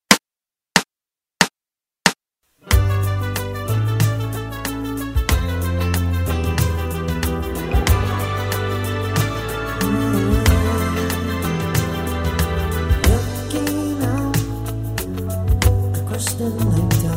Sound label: background vox